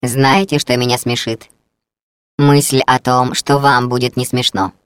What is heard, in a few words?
laugh; GLaDOS; taunt